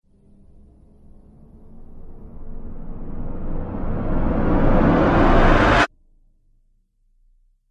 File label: Cinematic Riser Abrupt Stop; Rise And Dive Sound Effects